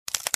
egg cracking sound effect crack

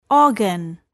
organ

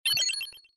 science fiction keypad input correct tone slow

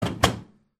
microwave door close

